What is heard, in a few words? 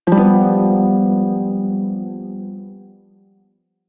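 Prime Shawzin Major Chord J; Music; Warframe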